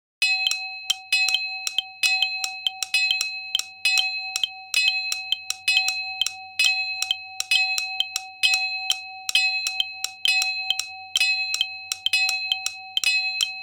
Sweep Rhy

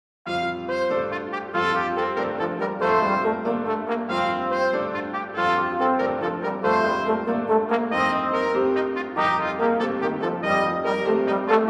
Divertimento, Column Air; Blacher

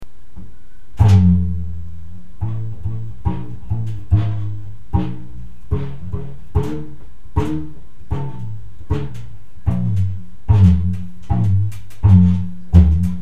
washtub

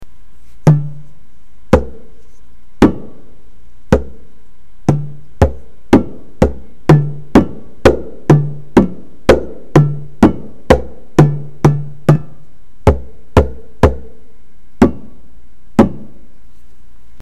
drums

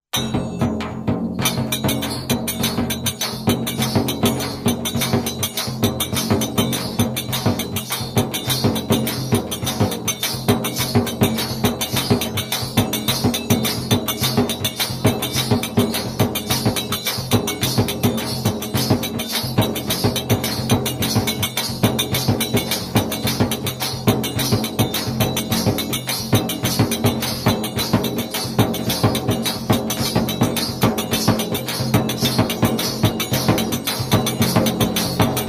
frame drum

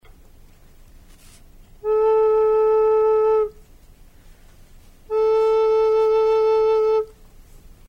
plastic wood recorder

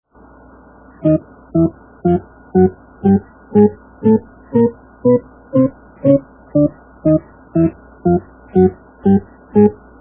ascend scale

